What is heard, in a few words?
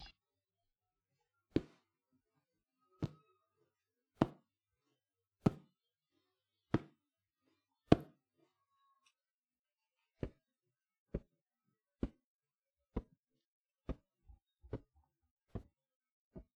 Punching Pillows